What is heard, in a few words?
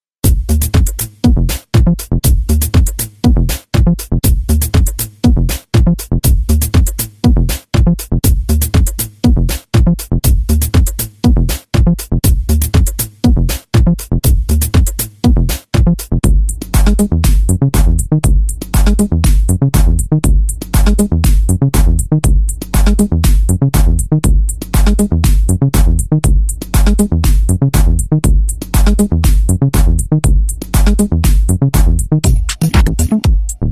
House Bass Loops